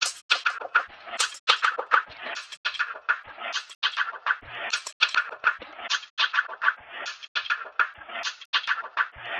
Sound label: Osprey Perc Loop - 102 BPM; Cymatics; Fiji Hihat Loops & Percussion